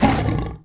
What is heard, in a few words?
Lion Hurt Vox; Civ4